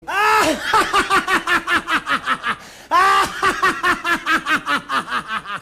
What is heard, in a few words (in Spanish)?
risa